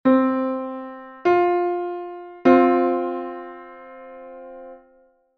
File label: 0-514,29 fourth (7-EDO)